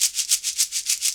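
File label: perc african shaker, shaker loops; Roland LCDP14 Africa VOL-2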